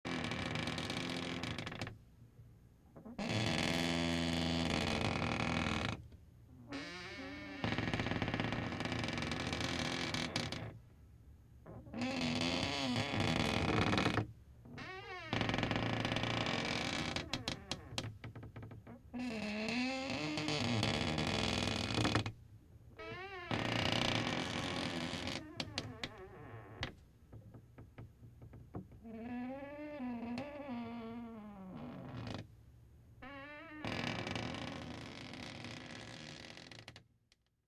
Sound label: Creaking Wood